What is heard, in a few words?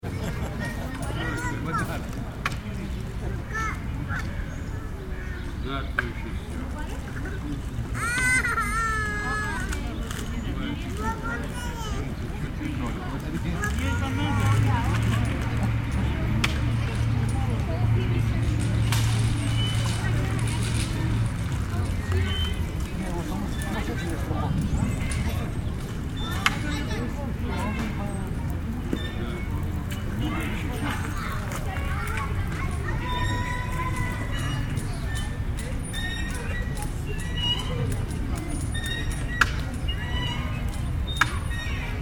City Park Russian English, Active walla, children, men and women; Russian and English voices. Men playing dominoes, kids on play; City Sound Effects